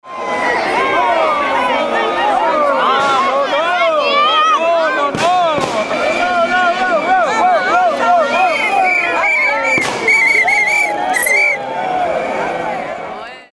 cheers and fire crackers

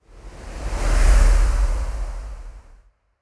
whoosh air

